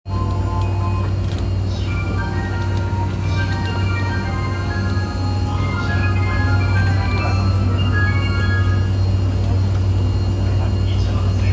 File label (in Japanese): JR 山手線 新大久保 CW, train sounds